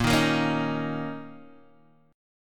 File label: Bb Minor Double Flat 5th, Guitar, DADGAD; x,1,1,3,4,1